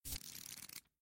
page flip back

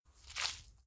squelch

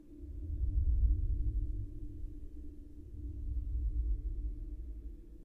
rumble

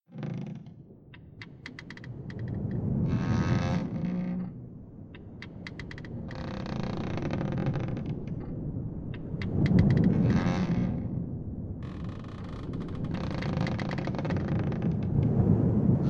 Creaking Ship

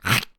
hiss girl fail

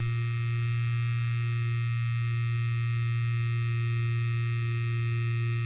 vibrator low

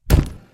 punch

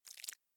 slick

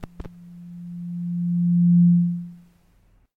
microphone feedback

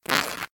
cloth rip

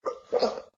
gag

